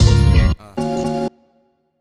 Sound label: jingle